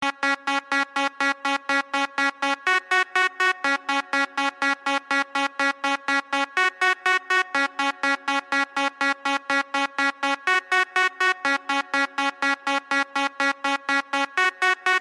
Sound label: yo-yo music